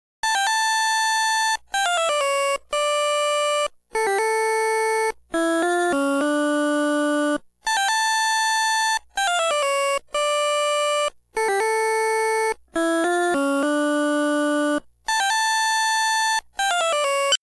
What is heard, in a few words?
Ringtone; Gyakuten Saiban 2 Original Soundtrack